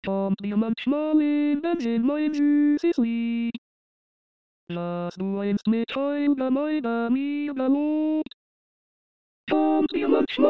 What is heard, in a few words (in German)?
Zigeunerlieder tutti